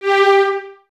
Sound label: Violins Detache G 4; Miroslav Vitous; String Ensembles